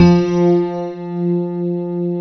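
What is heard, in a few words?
grand piano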